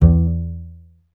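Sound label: string contrabass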